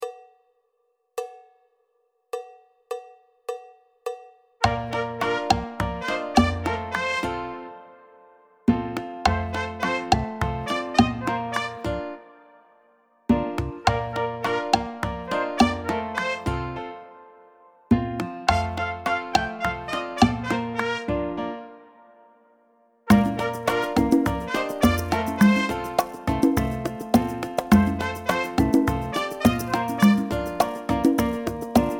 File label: cha cha cha Solo